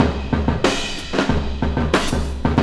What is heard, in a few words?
CRASHY 95, loop; 0- 99 BPM